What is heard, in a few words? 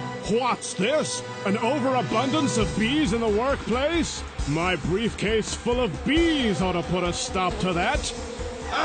briefcase bees; Paradise